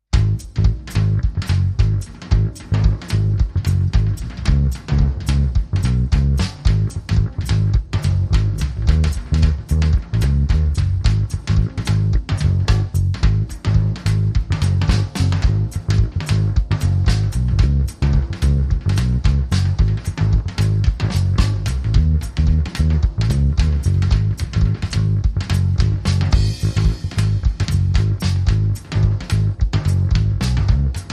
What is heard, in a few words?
Jive Bass Go Round, Electric